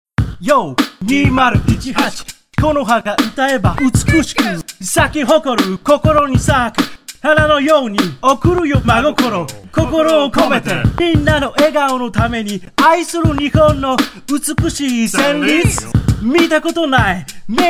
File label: rap